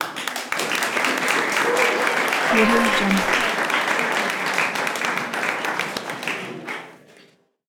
indoor crowd applause; debsound

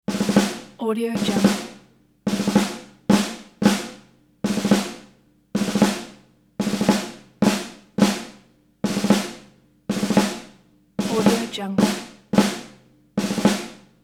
military snare roll; ferdi8

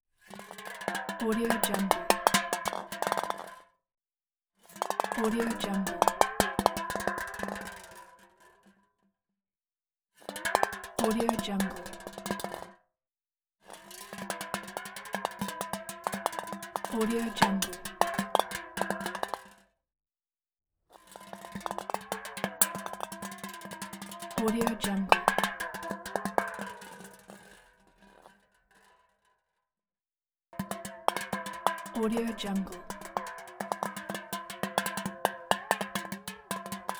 food can roll and jump

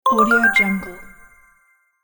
heal

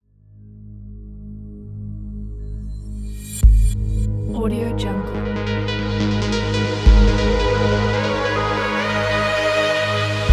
electronic air logo intro

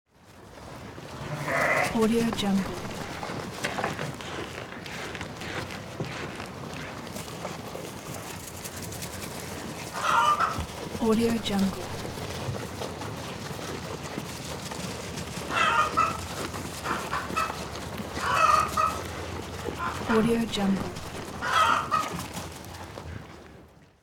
sheep barn ambience

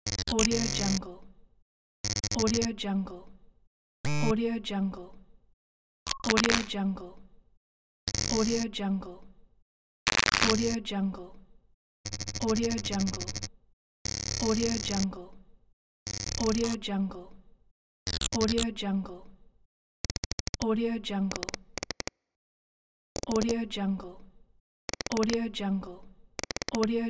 infographic glitch effects

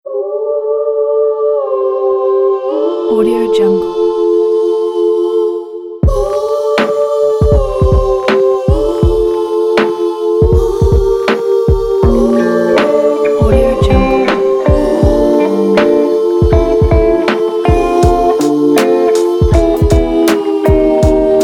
inspiring romantic meeting ocean vlog kit

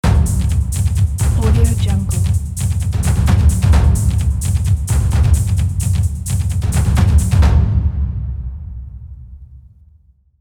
drum introduction

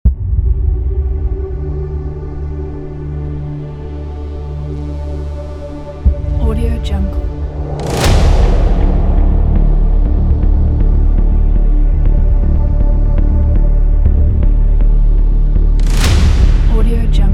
tension

